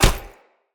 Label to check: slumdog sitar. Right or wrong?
Wrong